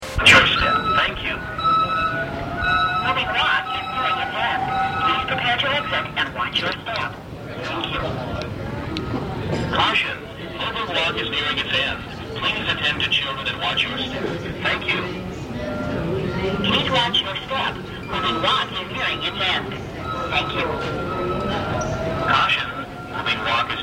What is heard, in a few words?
movingwalk